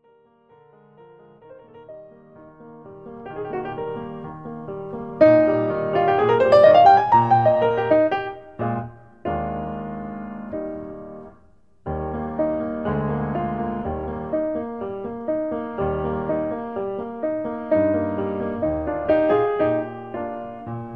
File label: HIGH VOICE